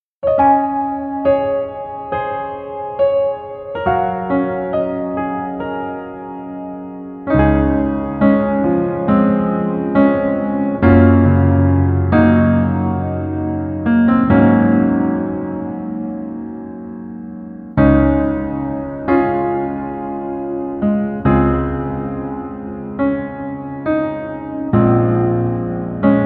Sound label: Piano ver